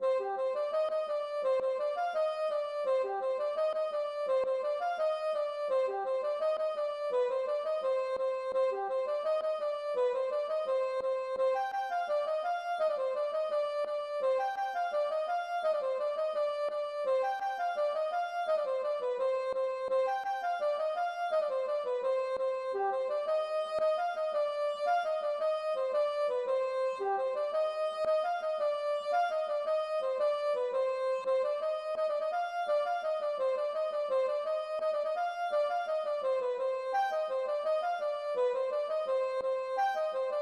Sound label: gavotte; Suite Termen